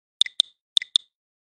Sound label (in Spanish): tono piri piri piri aviso para whatsapp